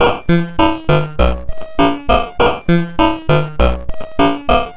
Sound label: Modular Loop, 100BPM; musicradar; bitcrushed; Crushed Loops